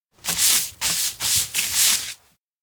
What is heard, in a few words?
dust off wipe; anemoi